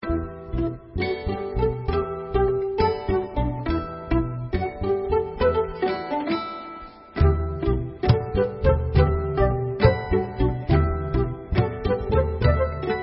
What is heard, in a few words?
Instrumental